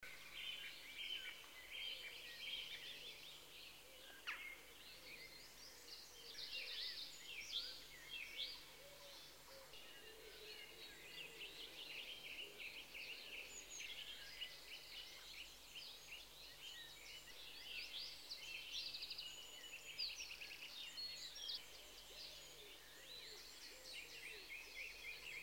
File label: birds